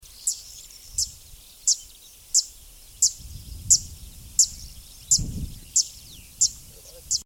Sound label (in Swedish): grassangare